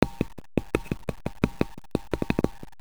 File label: tension, ambience; svencoop